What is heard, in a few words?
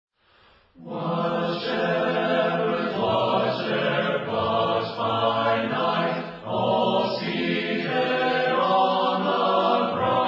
a capella hymn, while shepherds watched their flocks